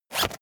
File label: zipper use fast